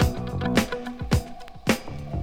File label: full voices in head, Phonky Loops